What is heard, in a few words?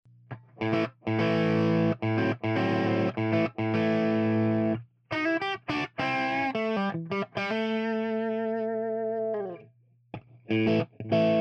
Strat Crunch; CBS Style Strat Pickups; Strat Sound Clips